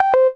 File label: device disconnect